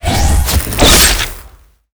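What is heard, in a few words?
guardian scorpion attack, monster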